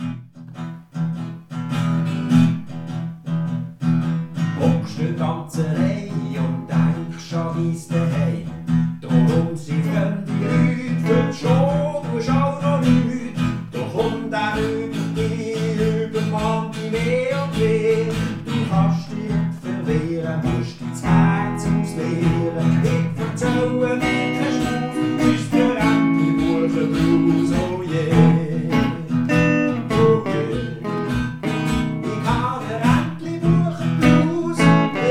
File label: Blues